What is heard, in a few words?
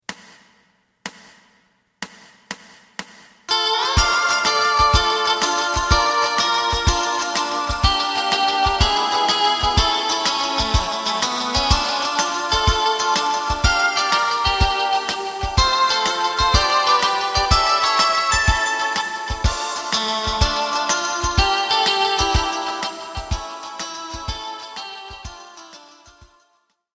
bass